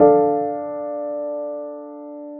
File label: chord Dm